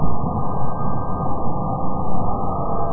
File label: creepy